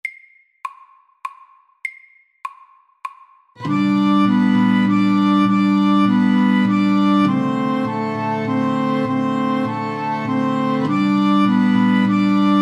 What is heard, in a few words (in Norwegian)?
Irsk melodi SLOW